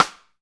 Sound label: SD, KIT Real-Kit; 90 s Sample CDs; Sound & Vision - Gigapack I CD 1 (Roland); Real-Kit m 5